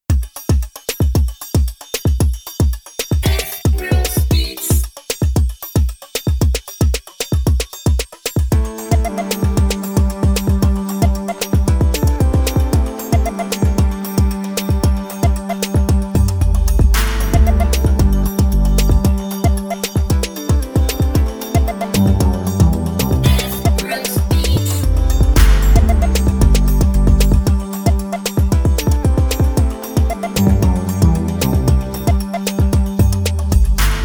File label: COCOBEAT 114bpm